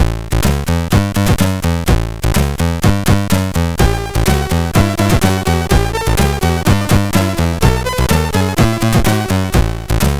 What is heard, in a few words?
Rock and Roll MOS6581R4; Mermaid